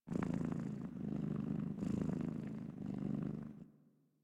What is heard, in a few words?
Catbrow Kitten Purr; Warframe